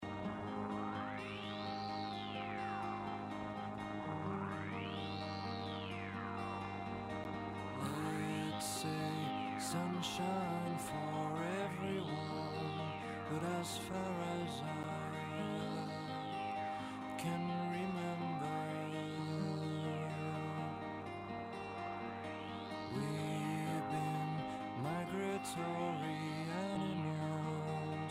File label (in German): musik ende episode; life is strange